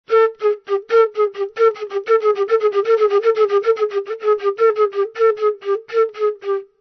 FLUTE COMEDY

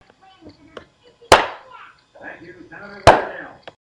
bam

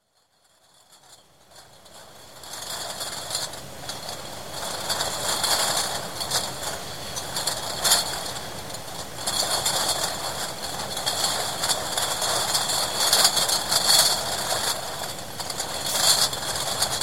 bottle plant

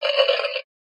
taking a bite

